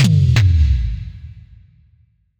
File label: Toms